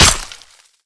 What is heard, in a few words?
hit, shuriken; weapons; garrysmod